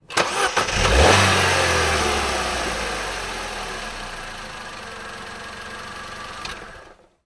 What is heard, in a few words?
car start idle off external vauxhall corsa 1.2; transportation; sound effects; sound effects one